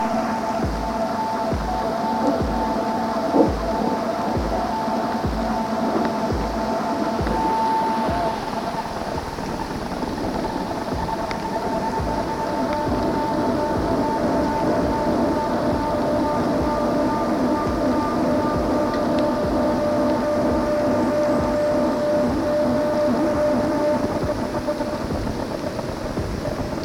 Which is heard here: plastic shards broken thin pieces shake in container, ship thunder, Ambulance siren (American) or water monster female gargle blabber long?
ship thunder